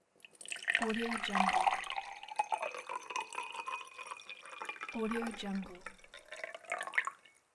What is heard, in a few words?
pouring water